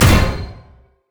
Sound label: vortex; Diana